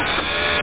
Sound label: gun swivel; gun swivel iff edit2; SCI-FI Sensation v2.2; apc mission